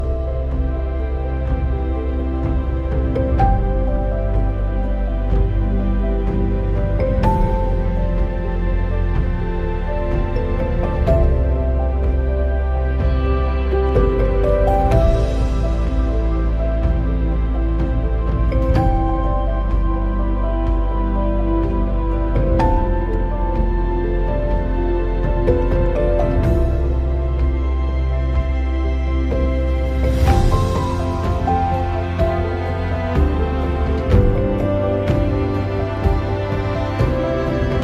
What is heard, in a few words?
Ringtone